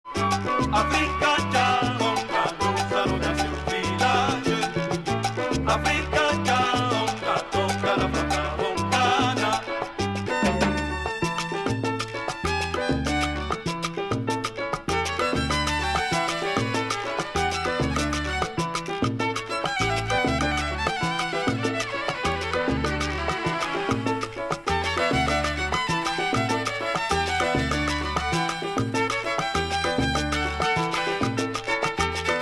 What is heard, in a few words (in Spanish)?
Salsa Y Alegria